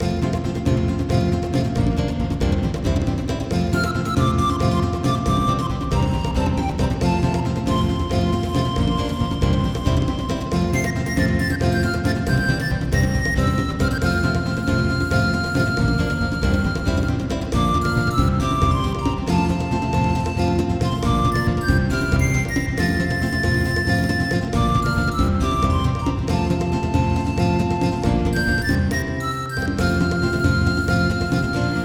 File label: Mariachi; everblue 2